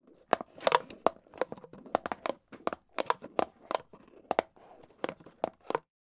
plastic lid put on drink cup pressure applied movement; food drink; macdonalds